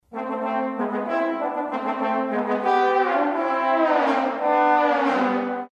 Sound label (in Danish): Tromboner; Messingblæserne